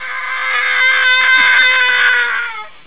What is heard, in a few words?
kid yell, A scared kid